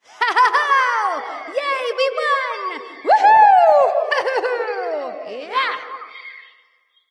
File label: yay light; smite; voicepack; hel